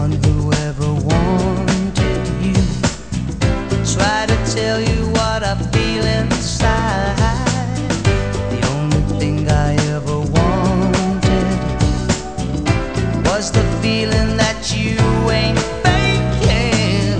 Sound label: rock